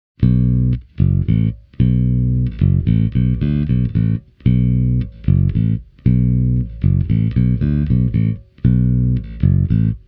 warwick corvette standard ash fingers

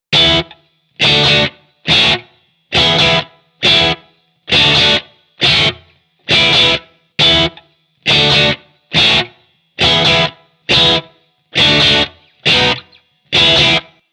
Fender Stratocaster – Hiwatt-style

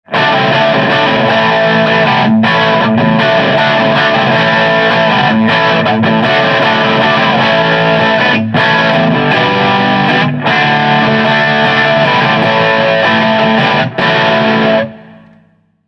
hamer classic overdrive usa

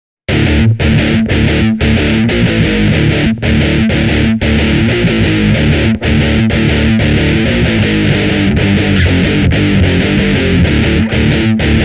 marshall dsl5c hamer ultra line

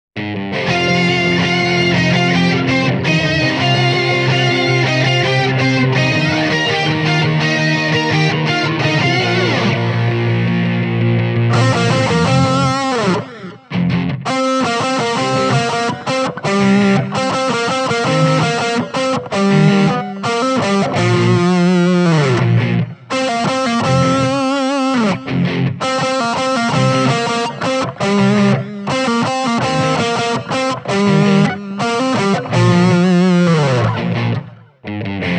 vox vx15gt guitars only

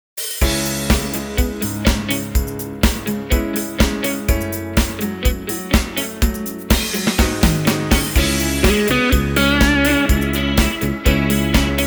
prs se custom 24 roasted maple

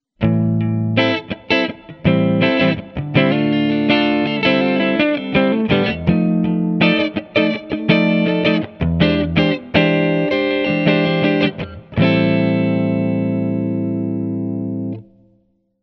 clean neck parallel, clean – neck-PU in parallel; Manne Ventura

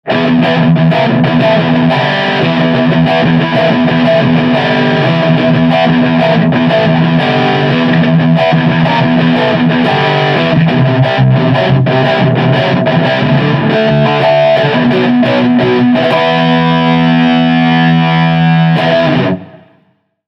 strat modern overdrive; Strat – Modern Overdrive ISF USA; Blackstar’s HT Club 50/HTV-412A

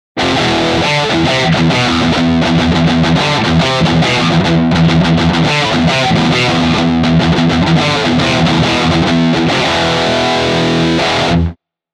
Tokai LC-85 – Rectifier – noise gate

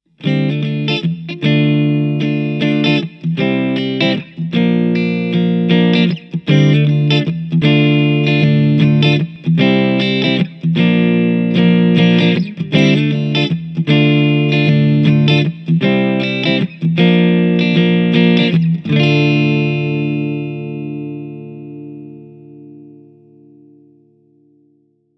blackstar ht metal 60 boutique clean shure

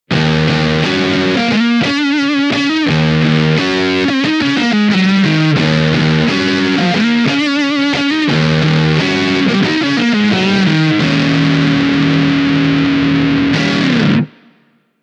fender amp fuzz; Fender Jimi Hendrix Stratocaster; hendrix sound

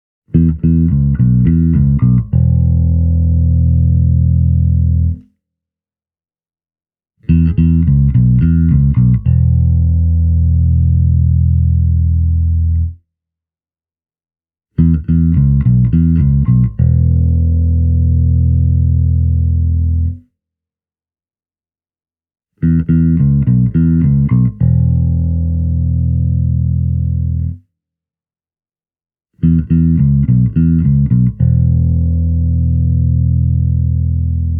markbass evo1 all amp types, Jazz Bass; Markbass Standard 410HF -kaapin kautta Shure SM57 -mikrofonilla; Basso on japanilainen vuoden 1986 Jazz Bass; digitaalinen mallintava bassovahvistin

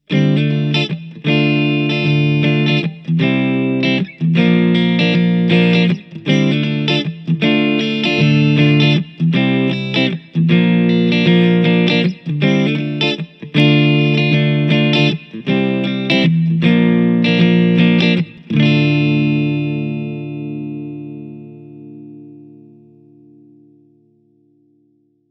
blackstar ht metal 60 modern clean shure

